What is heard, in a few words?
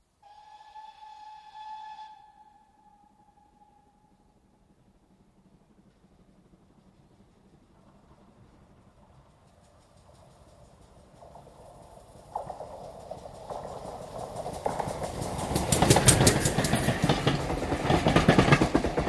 Steam Train passes at high speed with track noise & whistle, left to right; BBC